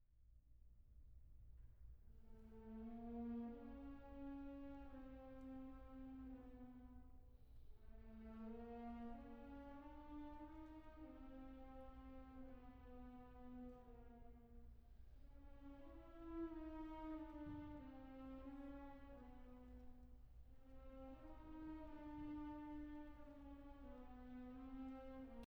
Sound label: Music for Strings opening theme; Bartok